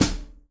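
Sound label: HI HAT, JAZZDRUM; Ueberschall; Drum & Bass Flash Trackz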